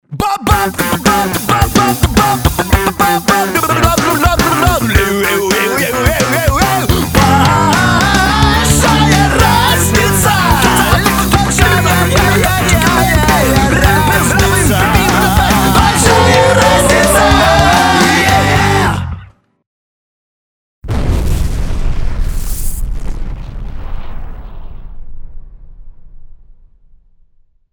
ROCK n RAP NOTEXT; Большая разница

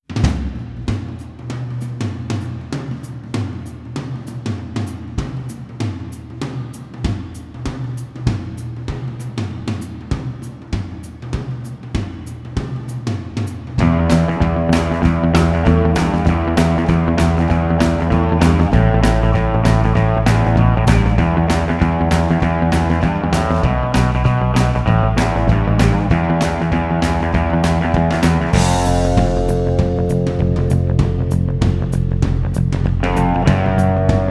The Blues Brothers 2000 OST

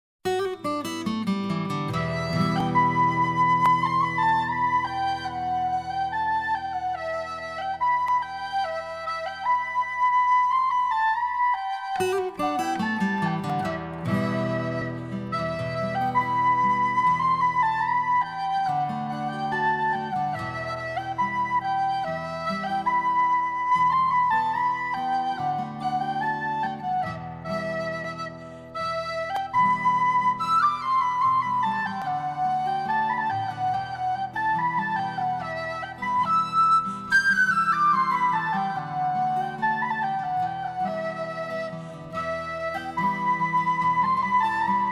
Whistle Tune; The Masterharper of Pern; Robinton's

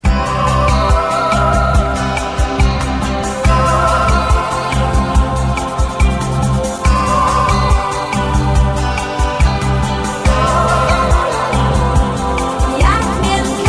Commercial

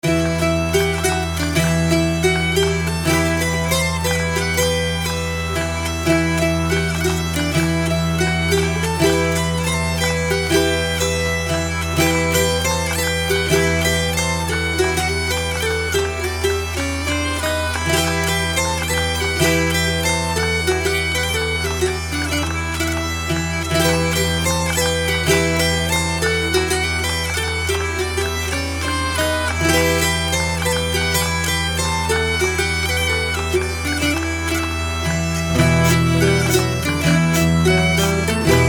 Polska

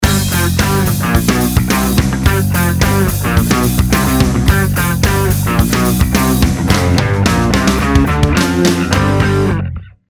Riff; Большая разница

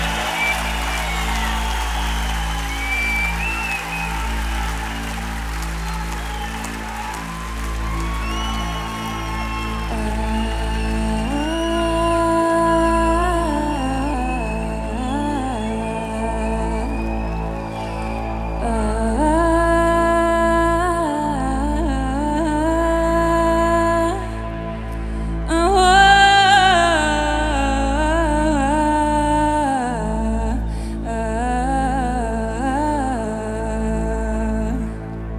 Noise

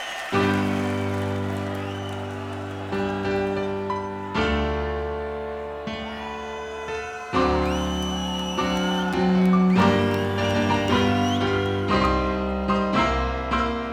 Piano Interlude

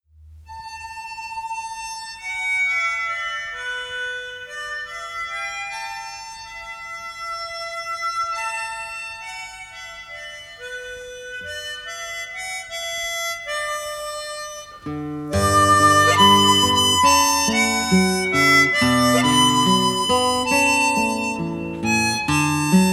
Harmonica; DEFA

